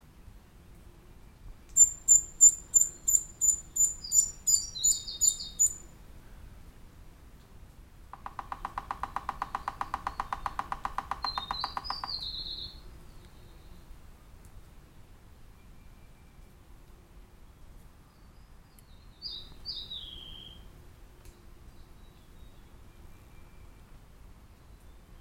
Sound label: piculet speckled Picumnus innominatus